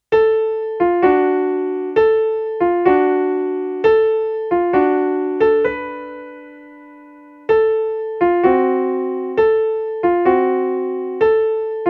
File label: Violin Rag; Ragtime